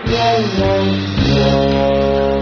cash lose; thenetherlands

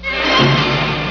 clock start

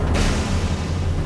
drumroll; usa

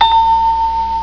buzzin

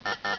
buzz x2; australia

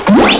lock in; usa

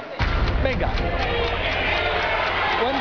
timer; spain

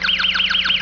ring in

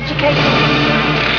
outta time

